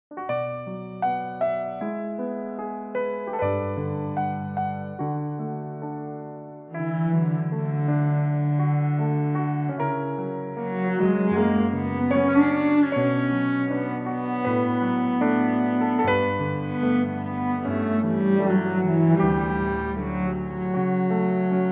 D play cello reference

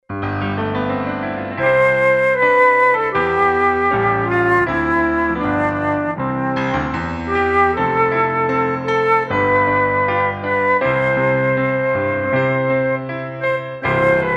flute

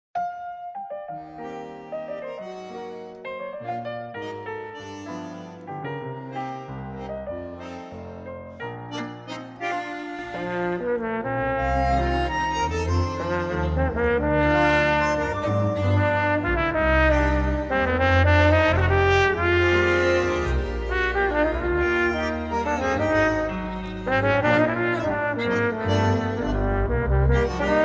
Bb trumpet

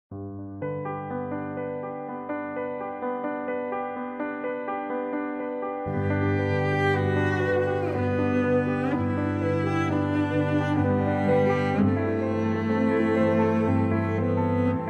play referencia cello

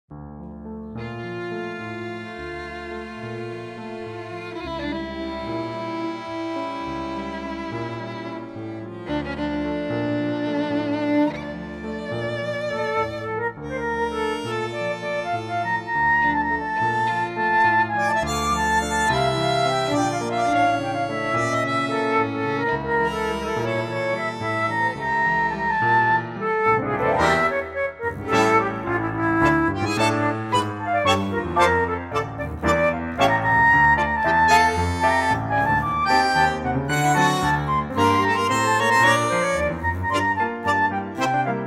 flute, Dm

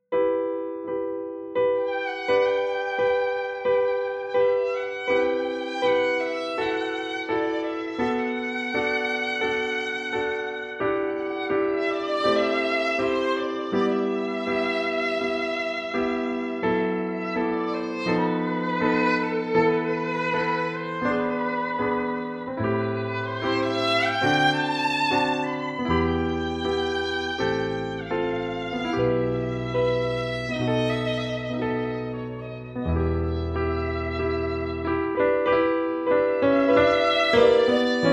Vocalise piano violin

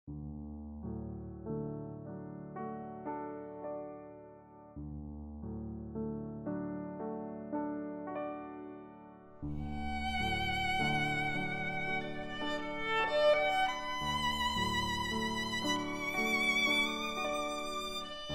Meditation, Piano, violin

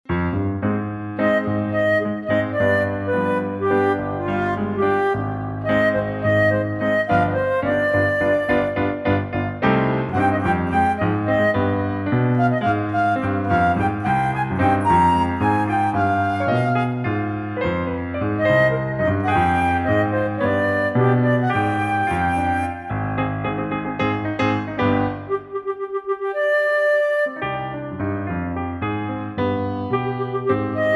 Cm piano play flute reference

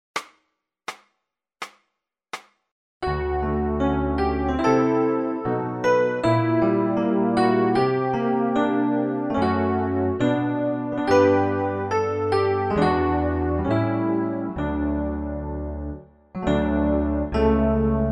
D modern

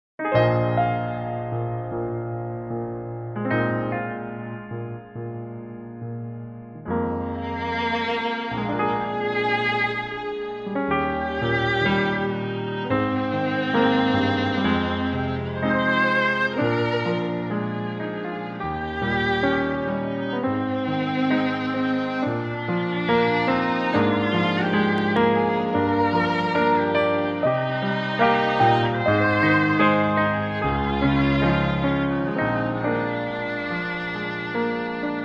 play violin reference, Eb

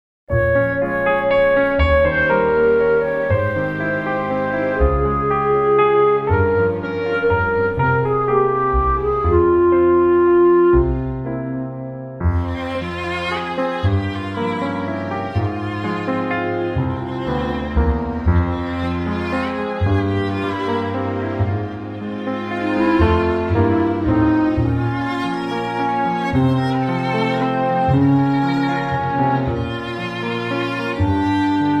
Fm violin

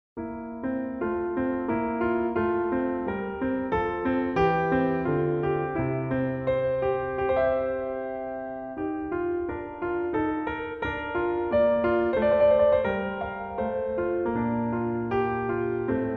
piano cello

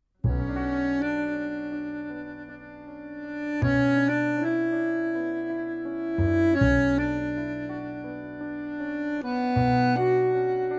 Bm trio

